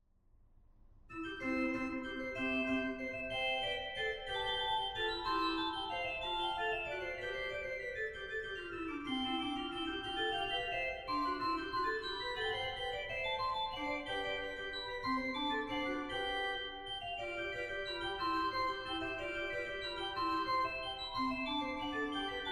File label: Floetenuhr Presto, organ; Flötenuhrstücke; goenn; 1844 Franz Xaver Engelfried Organ; Joseph Haydn (1732-1809)